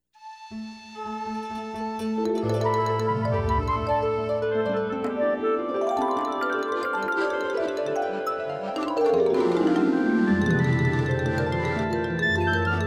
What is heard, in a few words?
Orchestra tune-up; Baby Einstein